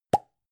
gum plastic box open hard

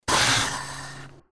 pneumatic blast

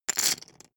poker chip stack rattle

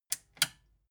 metal button click power off slow

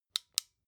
flashlight off

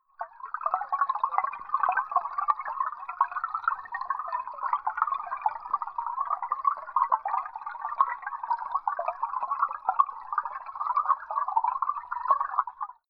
stream

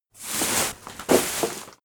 trash bag take put down

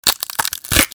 wood short breaks

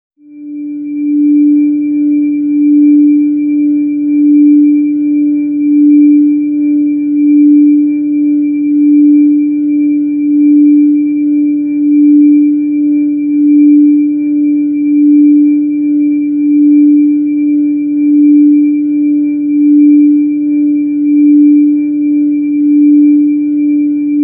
organ tone d

